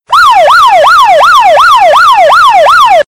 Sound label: Fast Wail; alarmas